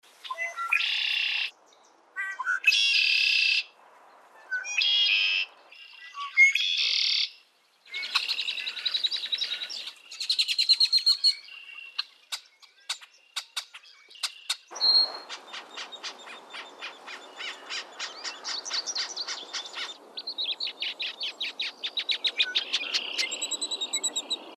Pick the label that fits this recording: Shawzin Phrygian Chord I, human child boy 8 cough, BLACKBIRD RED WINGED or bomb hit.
BLACKBIRD RED WINGED